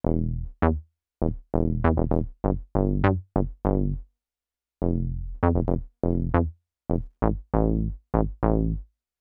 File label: Deep House Juno Synth Bass, E